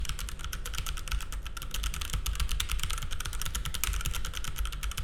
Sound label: Brown Switch